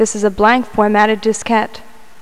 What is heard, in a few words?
ENSONIQ ANALOG BRASS